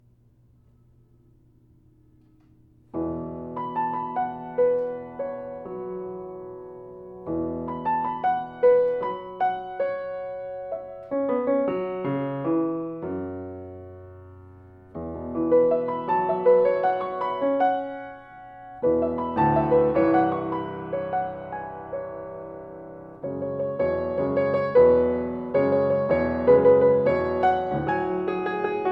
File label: Etude in Mixed Meter